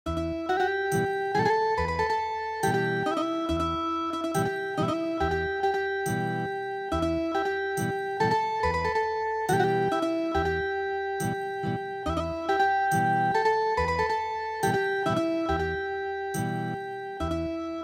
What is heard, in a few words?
Ethnic Guitar